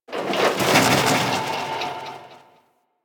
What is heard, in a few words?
Metal Scrapes; Ambience